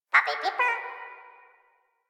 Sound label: flying robot voice